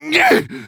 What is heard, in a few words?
taunt exert; Medic